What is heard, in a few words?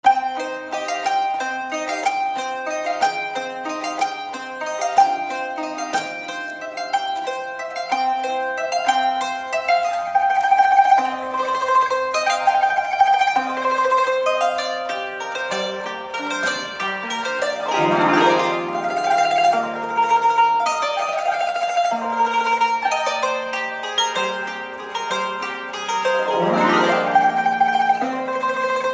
Qanun